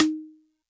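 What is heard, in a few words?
Xylo Snare DX100 D sharp 1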